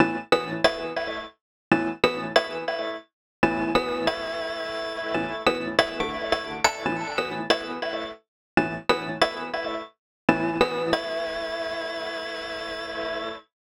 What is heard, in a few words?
Bpm140 Chord Loop Am, Dubstep; FX Loops